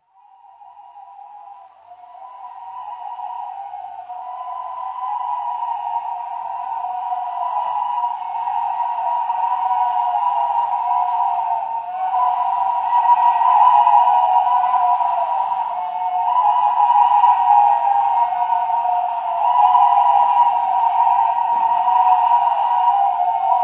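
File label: crane wedge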